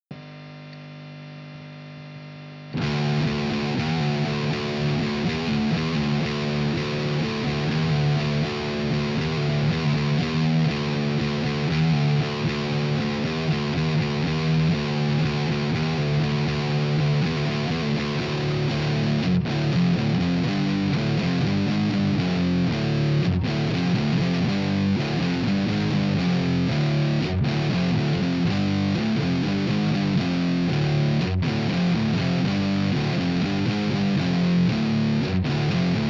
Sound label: Test Amp Room; Ekto; Softube Amp Room Bundle